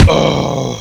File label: die; cstrike; cscenter